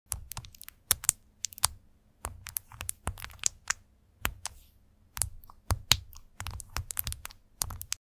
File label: Keyboard asmr; اسمر کیبورد با هوش مصنوعی